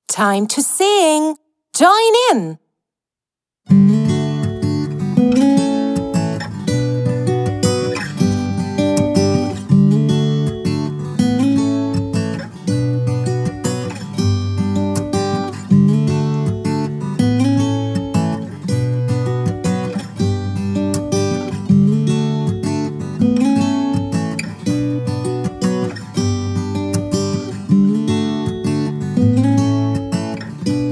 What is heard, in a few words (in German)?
englisch song karaoke